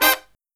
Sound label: LOW CHORD, Killer Brass Riffs